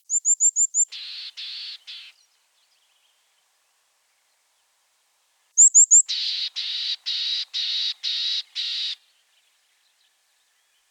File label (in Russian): burogolovaya gaichka golos